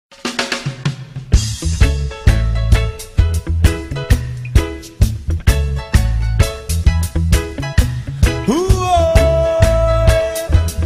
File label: reggae beat